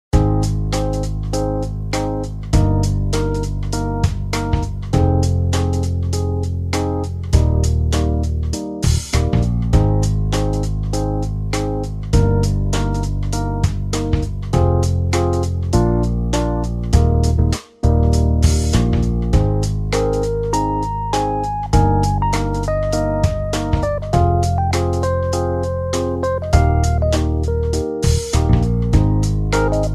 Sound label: Talking at Ease; 1bitHeart